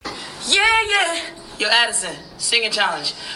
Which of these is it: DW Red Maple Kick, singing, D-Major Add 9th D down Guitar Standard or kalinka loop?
singing